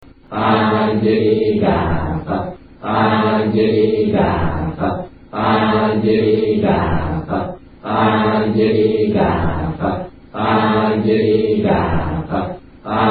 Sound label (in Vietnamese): Niem Phat Nguyen Chat; Uc Chau